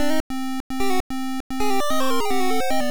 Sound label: Unknown Jingle; Aliens 2; MSX